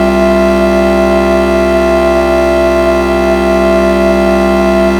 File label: oak Layered Filtered